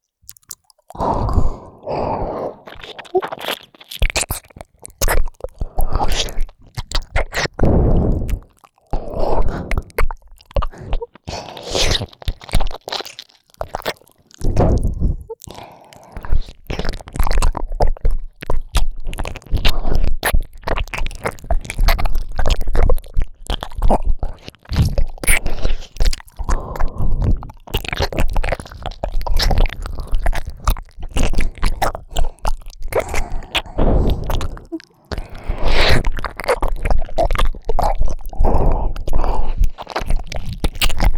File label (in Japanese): 左右ダブル耳舐め; 優しい年下JKに甘えた～い！童貞卒業委員会♪